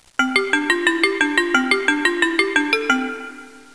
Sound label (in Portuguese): XILOFONE; INSTRUM